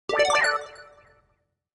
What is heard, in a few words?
pinball ringtone; whatsapp